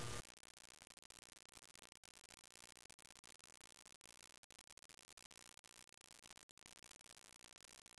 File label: SFX leaves; Gothos